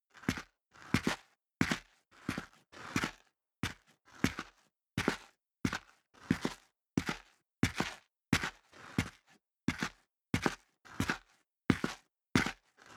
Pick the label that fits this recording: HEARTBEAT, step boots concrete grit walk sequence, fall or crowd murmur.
step boots concrete grit walk sequence